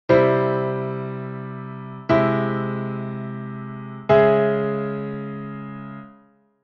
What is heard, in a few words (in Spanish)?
Modulación Do M – Fa m con 5 aum